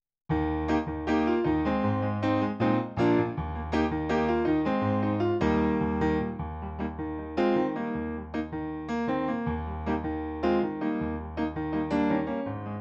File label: Backing Track